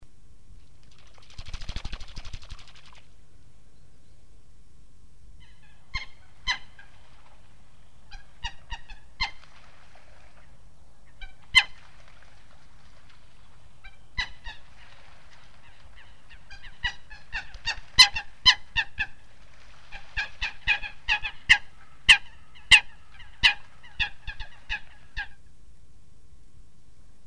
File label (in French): Foulque, Oiseaux; Sons Oiseaux